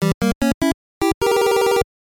Gulp

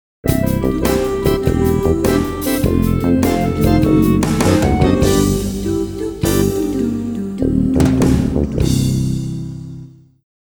Retro Zone Completion; Frogger He's Back

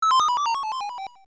Missed; pang; mitchell; arcade

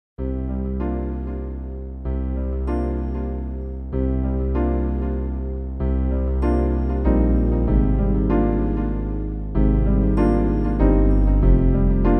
Reminiscence (Sad); Persona 2 Eternal Punishment

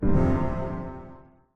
Rebloom Drum; Musician

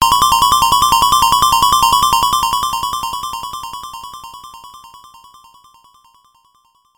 Fast Forward, Rewind; Catrap; Gameboy

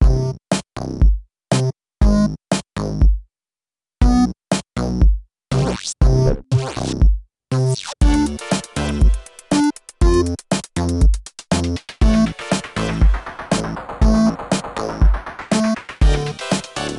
Pad; 3ds gamerip

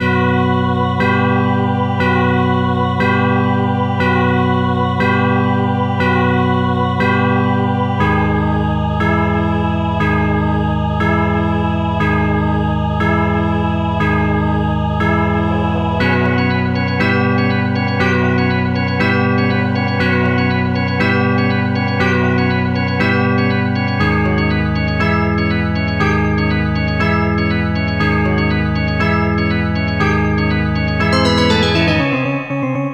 Boss Pre Dramatic; sonic epoch

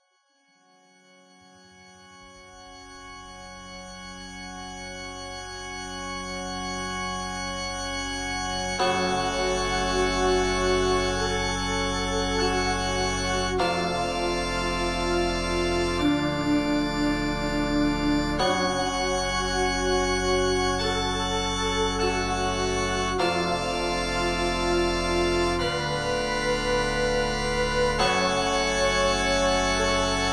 Ambient Church Moody

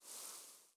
grass move

